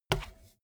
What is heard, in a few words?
bmx bike tire kick